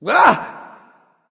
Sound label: nv breath; smite; voicepack; xbalanque